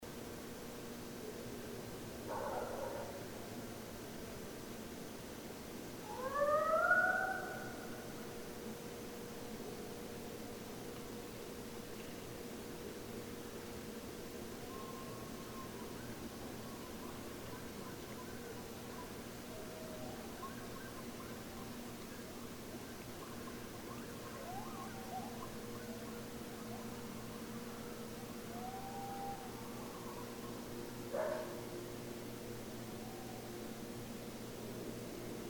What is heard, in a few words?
Coyotes; Coyotes (Canis latrans)